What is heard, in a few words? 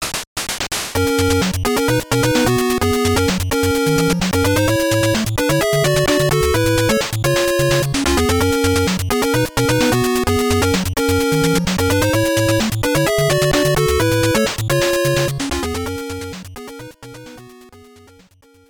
Match Play Win; Rollerball; NES